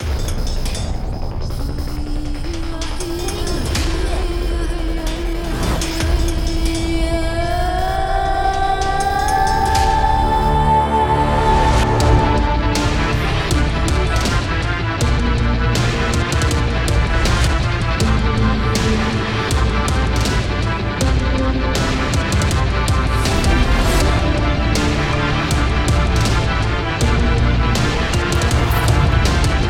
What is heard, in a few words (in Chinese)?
电子-战斗-紧张